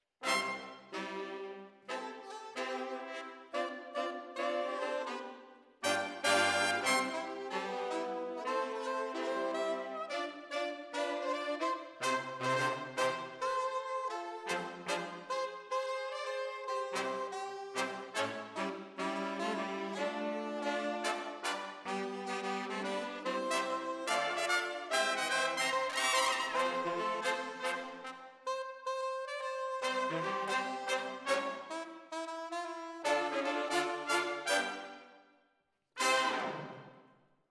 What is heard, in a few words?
ORTF, Jazz for piano